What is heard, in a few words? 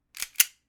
Glock Reload